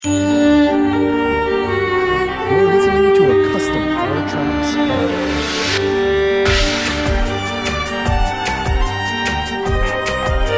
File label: hungarian dance